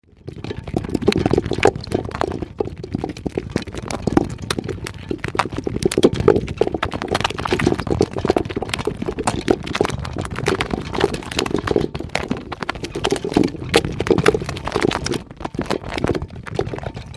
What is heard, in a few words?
Roller Heavy Metal, Garden roller over various hard ground; Yard Tools; Tom Hutchings